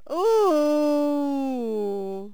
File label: valkyrie die; megaglest